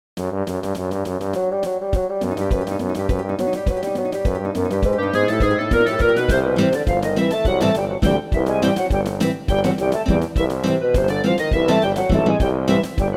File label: POLKA; The Incredible Machine